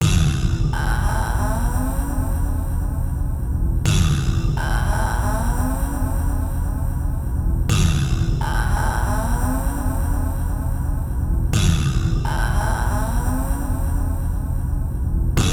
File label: Leading clock